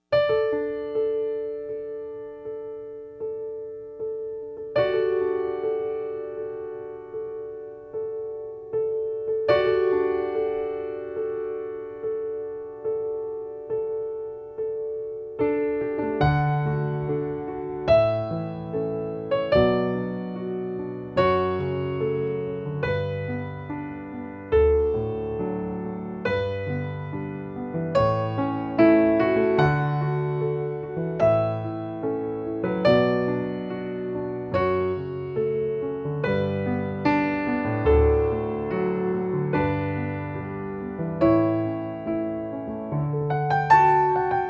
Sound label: Pachabel Cannon In D, new age band